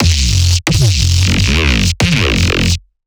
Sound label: F neuro 580bpm